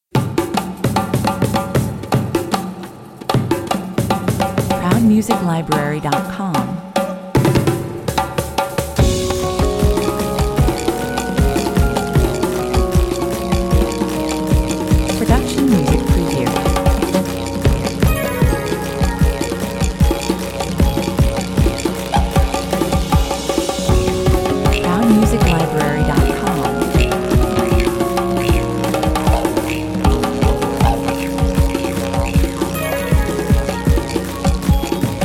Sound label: afro australien atmosphere